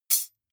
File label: midsize metal thin clank drop